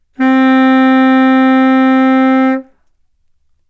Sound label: Baritone Sax C4